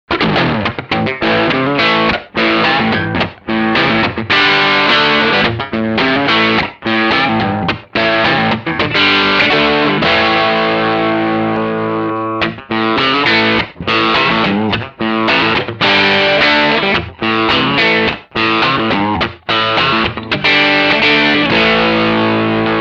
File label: Humbucker Crunch 3 Positions